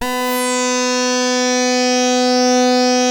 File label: SAW RES WET, SYNTH; Keith Emerson Signature Series